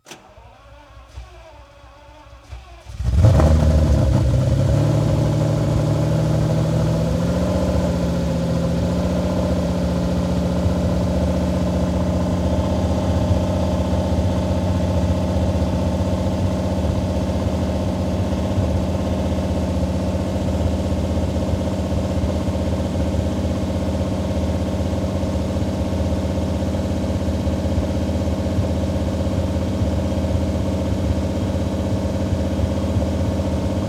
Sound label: Lamborghini Start Idle, Automobiles